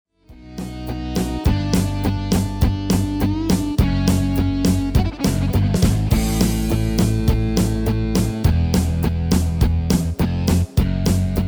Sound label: MIDI